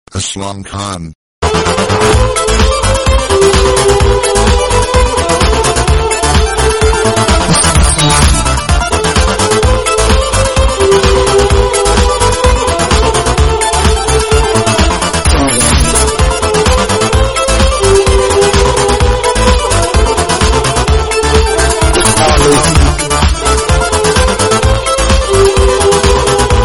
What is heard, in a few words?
sarangi, disco dance